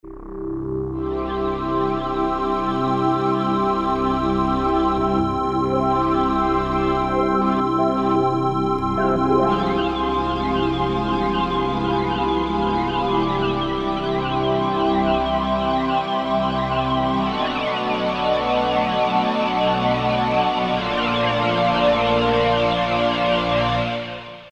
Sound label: SPLT PAD, Yamaha A4000; DJ - Producer Tool Kit